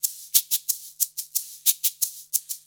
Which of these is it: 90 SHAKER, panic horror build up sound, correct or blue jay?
90 SHAKER